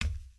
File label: Foot Steel Boot Walk, footsteps